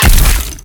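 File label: pistol up, impact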